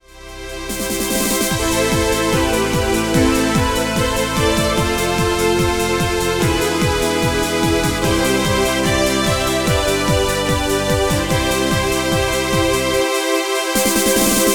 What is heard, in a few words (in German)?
Energy, One-Touch-Preset mit VSP-Style; VSP-3; 450 CT; OTP mit VSP-Style; VIVA MUSICA Fachhandel